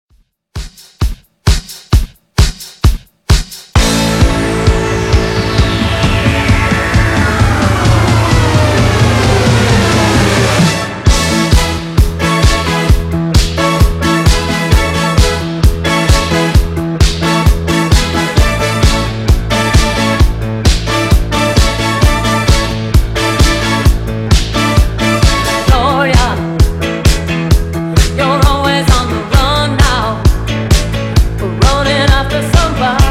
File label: Re drum